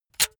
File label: razor retract comb fast; maschinen; rasierer; soundpool